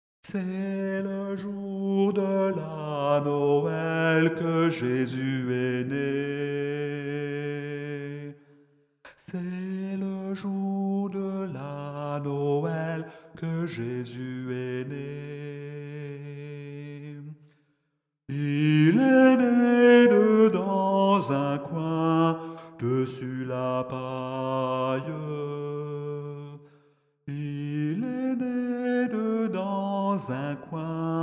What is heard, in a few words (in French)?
noel basse